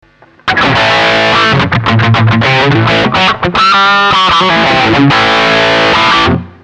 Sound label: bypass; jcm800mv; Marshall Guv'nor Plus